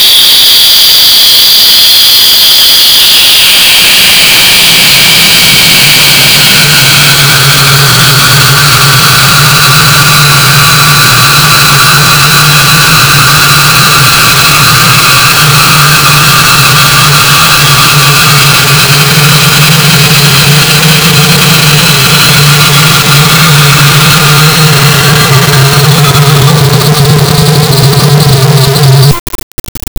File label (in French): son de globules blancs, biological